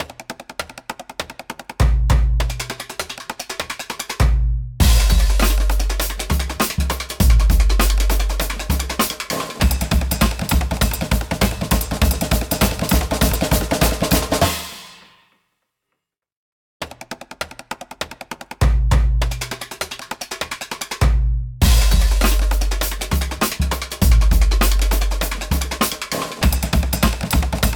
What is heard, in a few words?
Pleasant Marching Drum, alarms; LG K83 5G